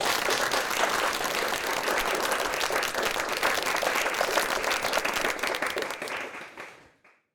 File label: hall clapping hands